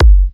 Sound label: F# kicks; markus hakala kicks